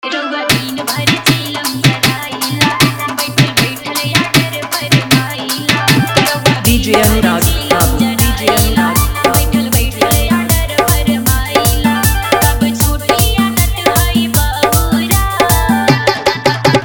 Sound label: Faadu Bolbam Dance Mix